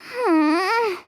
hmmm

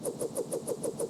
hover

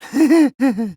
"heh"

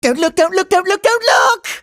breakdown

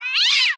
cat

